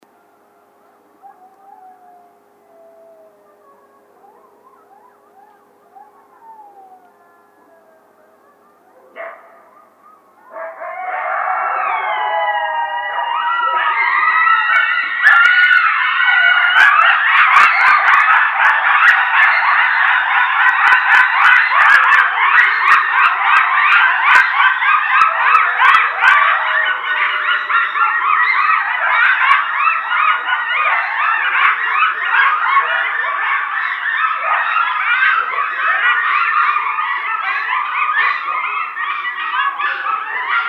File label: howl, Coyote; Canis latrans; Coyotes (Canis latrans)